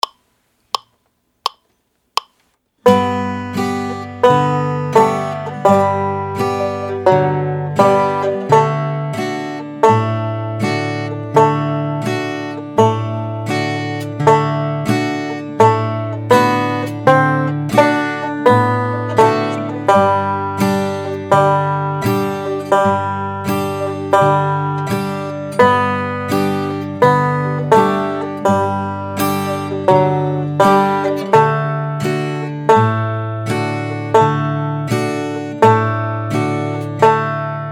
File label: Blues